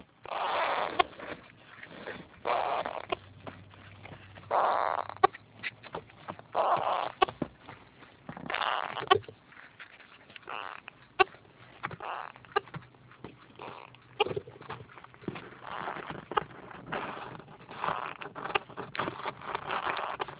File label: Baby Cries, Alexandrine Parakeet; Joplin